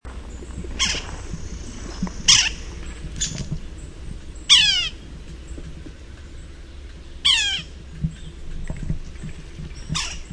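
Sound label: Yellow-bellied Sapsucker drumming waa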